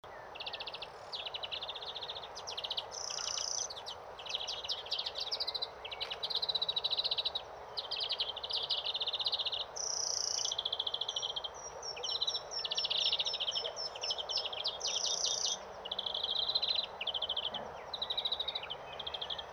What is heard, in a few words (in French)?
verdier chant